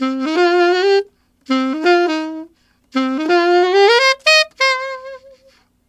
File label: Alto Swing Shuffle in C7, Sax; Sonic Foundry (Sony Creative Software)